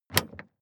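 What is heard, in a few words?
sports car exterior bonnet open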